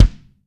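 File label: RAW bass drum, Drums Acoustic; AKAI; 90s sample CD; USB Soundscan vol.10; RAW KIT 5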